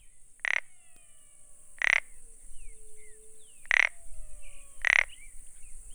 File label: Pseudis platensis; herpetologia; cantos